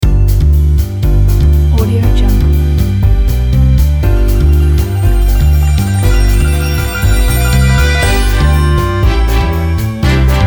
cooking show

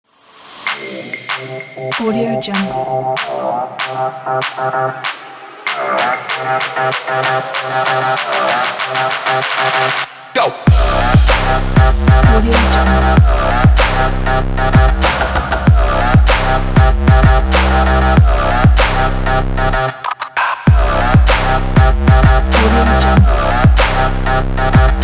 trap

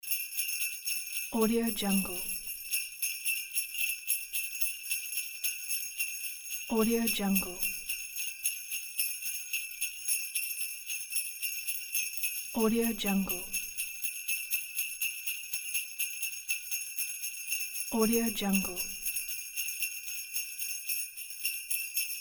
christmas bells

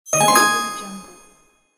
achievement

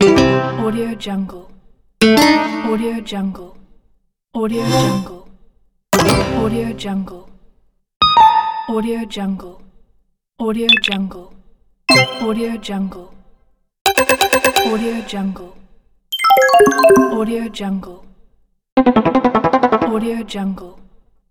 phone application ui sfx

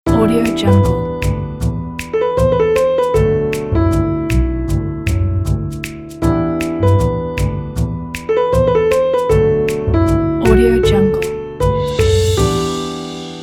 brooding jazz logo

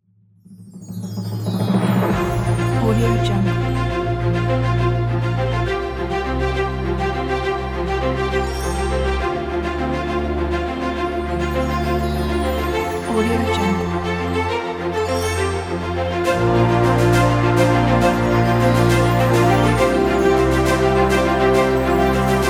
epic winner ceremony award; colorfilmmusic

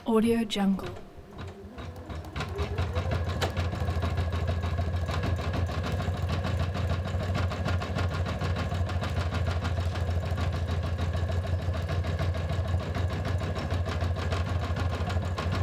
embroidery machine

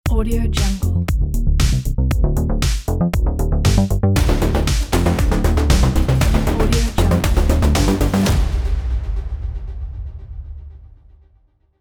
cyberpunk electro logo

